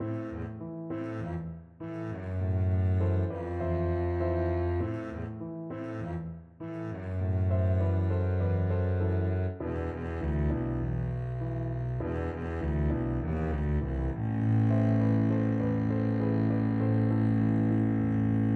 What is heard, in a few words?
mission, double bass